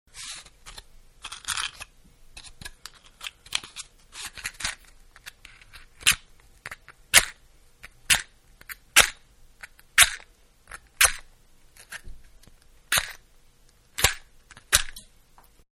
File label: Match Lighting, Match Wooden-Frustrated Lighting; Flames; Objects